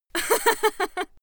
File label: female laugh